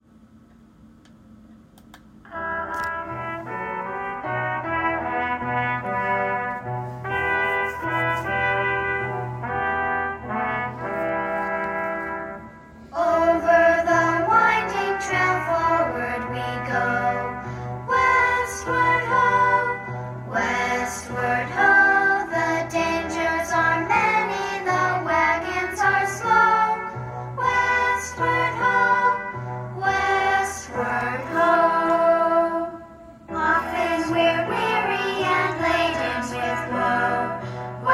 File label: Westward Ho vocal